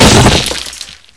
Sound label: bust crate